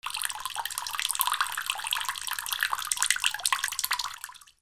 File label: BATHROOM